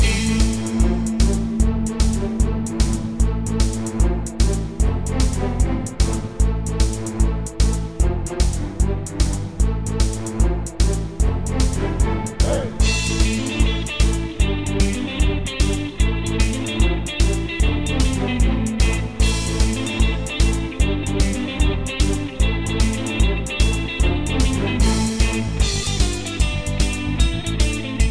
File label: Pirate; jett tailfin; wii u